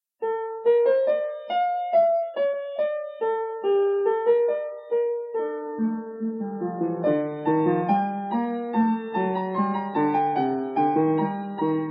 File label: fugue